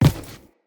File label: Crew Footstep Default; Railjack Crew; Steel Meridian